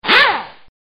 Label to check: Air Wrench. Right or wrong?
Right